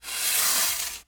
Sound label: Industry Tool Shovel Sand Slide Shovel Across Sand Fast